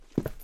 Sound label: step